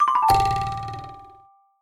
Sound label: chip fail